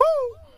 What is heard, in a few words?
ooh, Voice